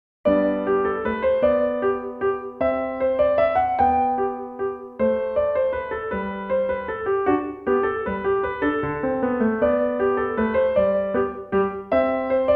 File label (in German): Menuett G dur Klavier; KASPERL; Bach